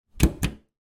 household trash bin lid push down to open

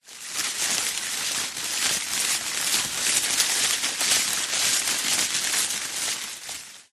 tree branch thick healthy leaves movement rustle; foley; zapsplat

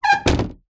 household door front close squeak; zapsplat

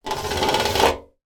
household metal radiator hand run across; sound effects one

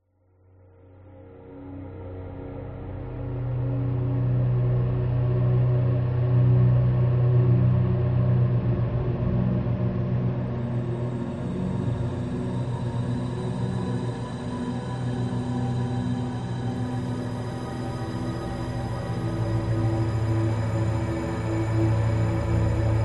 soundscape mysterious evolving musical; scott lawlor

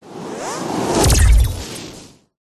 whoosh; Audio Mangler

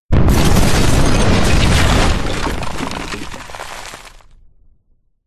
bomb drop massive explode debris

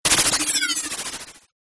sound design fm modulation burst; zapsplat

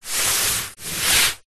sport exercise mat foam hand rub

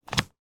household cell phone battery put in

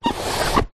cardboard box parcel slide on wooden floor; foley

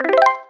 multimedia correct complete bonus; dusty room

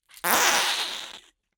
cartoon squeeze squelch fart

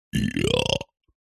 human male burp vowels

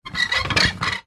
household toilet roll holder wall plastic squeak; zapsplat

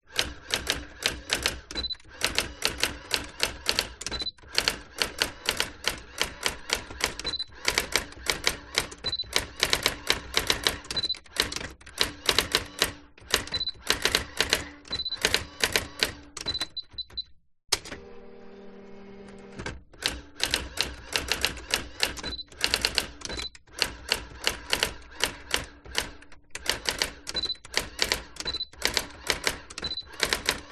electronic typewriter typing slow; office; brother ax 450

